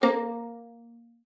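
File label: violin pizzicato A#3; Xsample VOL-5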